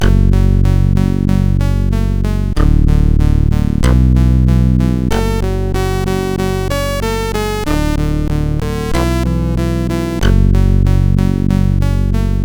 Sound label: One Pattern, sid CSG8580R5